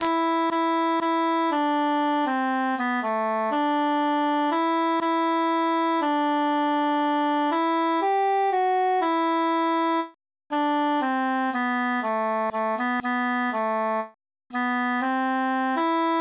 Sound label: transposed tenor solo; la bionda trecca